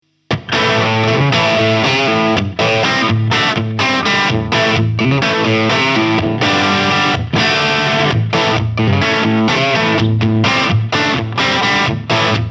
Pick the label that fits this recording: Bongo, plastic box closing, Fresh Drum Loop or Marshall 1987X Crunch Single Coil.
Marshall 1987X Crunch Single Coil